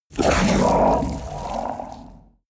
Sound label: kraken growl hiss; kreaturen